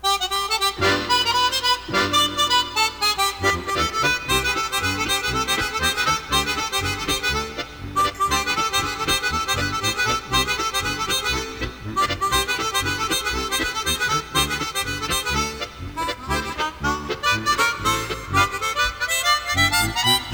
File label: bgm